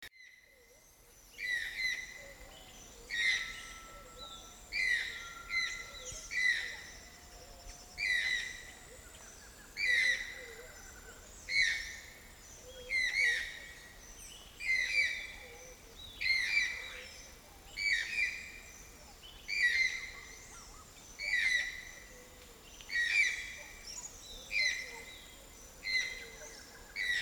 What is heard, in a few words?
Ramphastos vitellinus, toucan vitellin; oiseaux